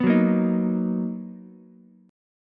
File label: B Major 7th B down Ukulele; strummed; JGuitar